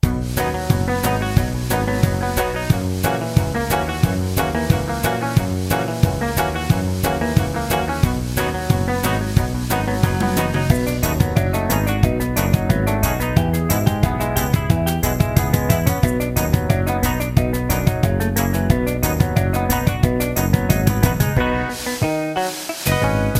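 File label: New Grass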